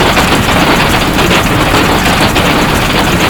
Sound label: tyres gravel roll; American Fugitive